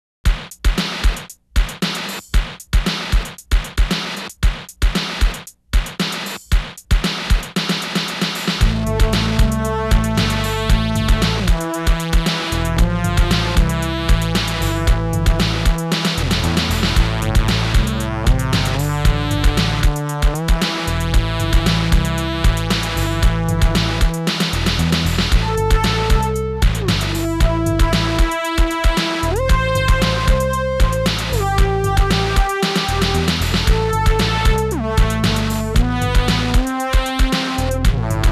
Distorted Moog And Drums Jam

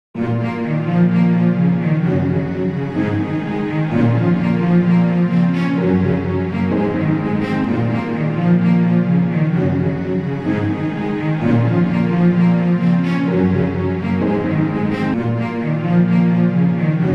GARRITAN TEST SEQ

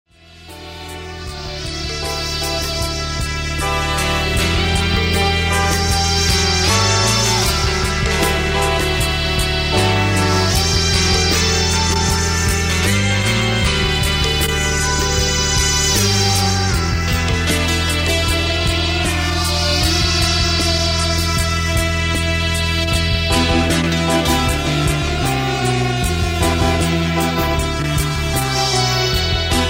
Reggae

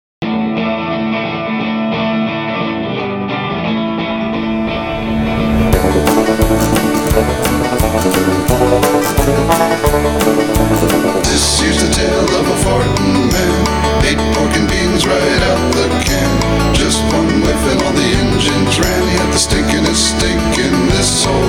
FARTY FARTY

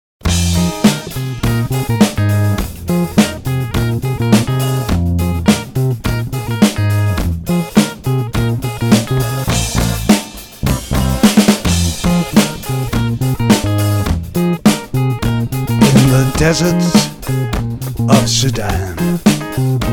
rhythmstick

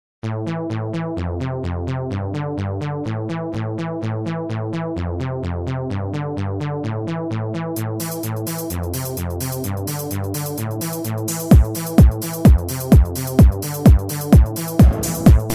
Disco dream

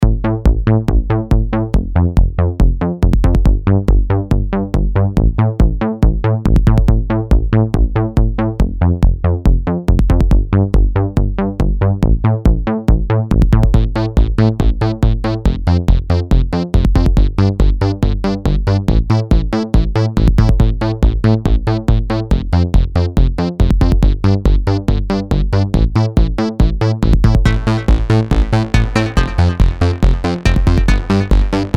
Old style mix

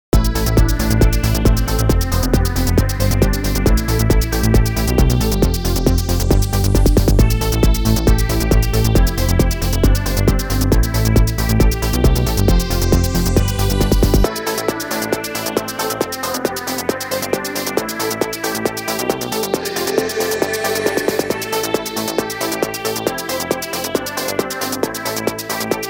dance

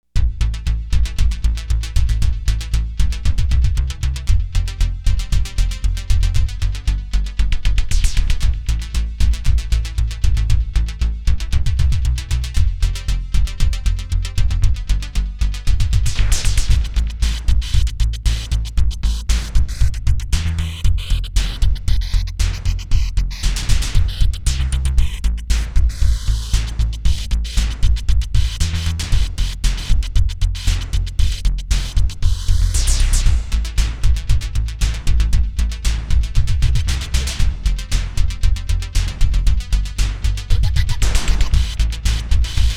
White Noise jam

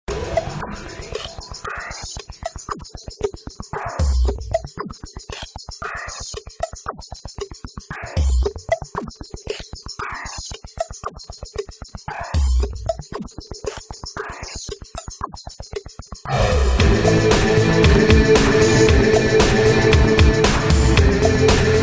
psi acid jam

